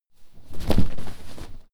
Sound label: clothes hit drop furnitures